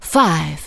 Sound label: vox, Techno Trance; Ueberschall